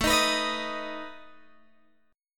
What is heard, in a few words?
A Suspended 2nd Flat 5th, Guitar, Open G